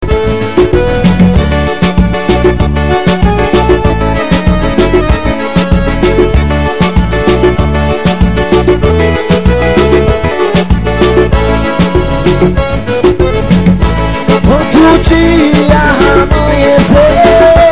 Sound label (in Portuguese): forro